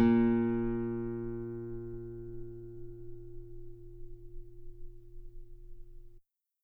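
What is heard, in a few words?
guitar A sharp 2 very long forte normal; bananenkeyboard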